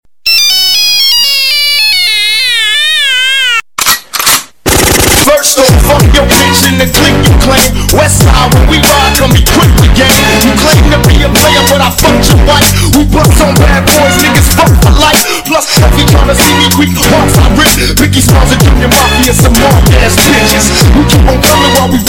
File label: Nokia Tune; Ringtones